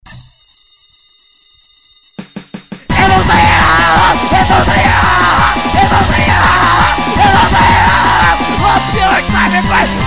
cybergrind

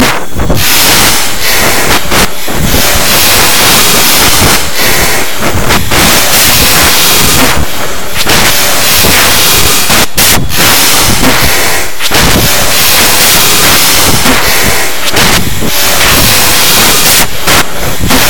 lovely Noise

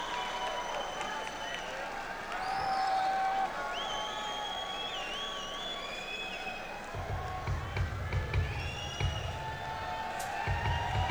Crowd; nak300

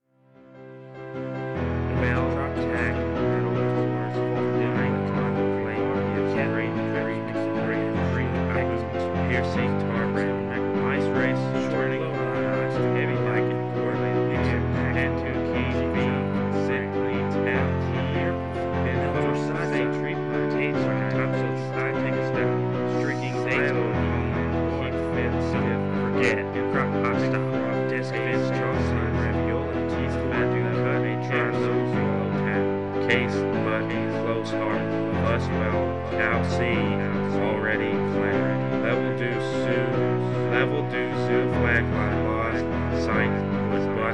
crying forward

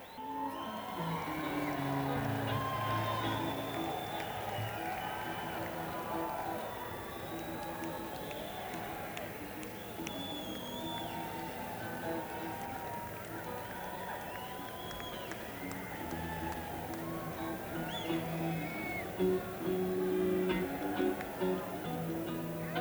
Crowd Tuning; neumann u87

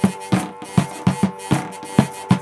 percussion hurdy-gurdy loop

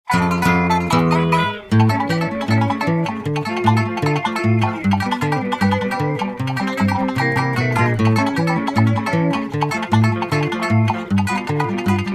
theme ragtime; SMW

